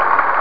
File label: applause; vocal